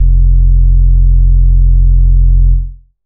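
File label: bass sustain F; Polyend; ARC Noise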